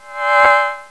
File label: WHAAUU; HIGHTECH